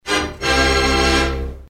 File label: funny; Rosegarden; TV3